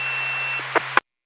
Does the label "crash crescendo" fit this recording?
No